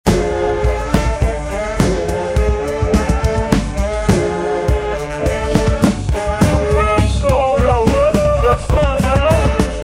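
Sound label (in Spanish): background para una batalla, distant shouts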